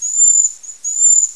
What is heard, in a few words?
wax see